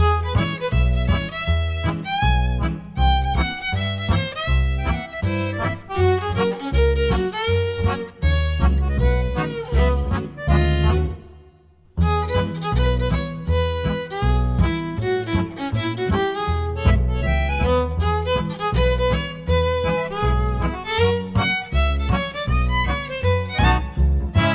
Simu Music

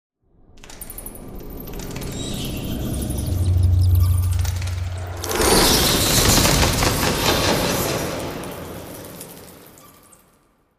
Bat Fly Away Group; the unfinished swan; Village

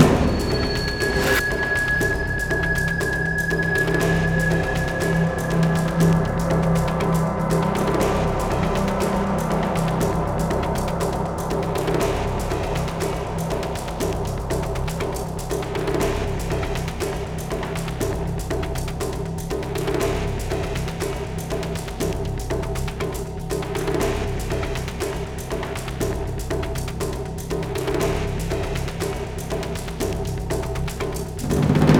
battle layer

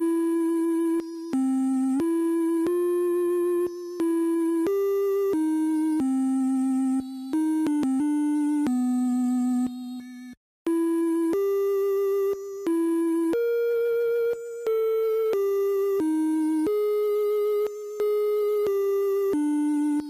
Music Character Mireille song; save me mr. tako tasukete tako san switch windows gamerip 2018

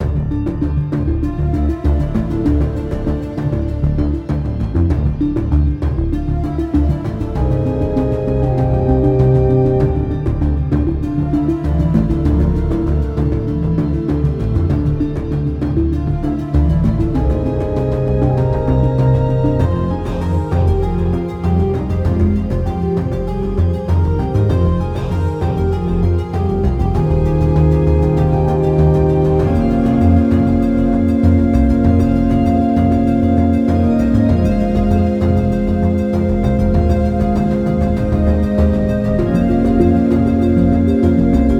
stage music; primal light linux macos switch windows xbox one xbox series xs gamerip 2020

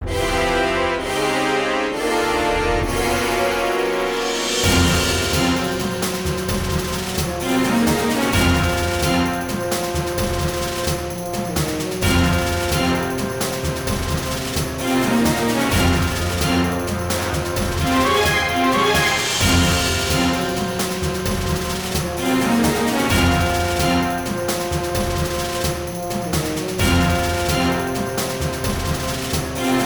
Encounter With Malice 130bpm; Evil Maze 2

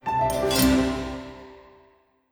misc jingle

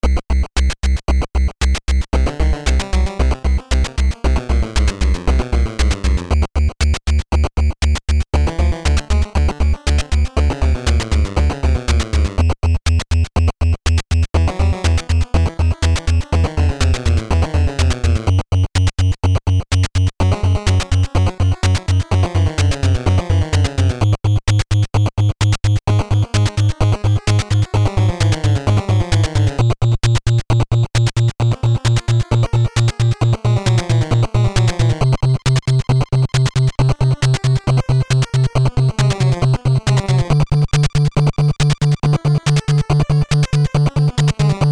Countdown; Digger T. Rock: Legend of the Lost City; NES

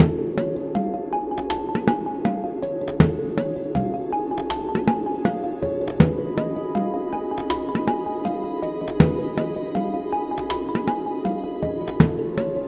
Indoor Variation Loop; Birdhouse

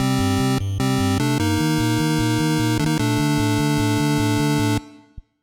Match Lose

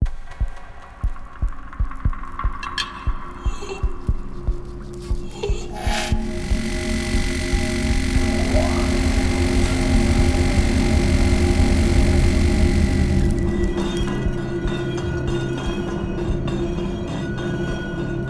portal; house of 1000 doors serpent flame

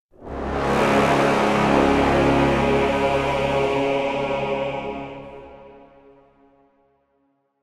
Floor Flower Stab; bramble the mountain king; ps4 ps5 switch windows xbox one xbox series xs

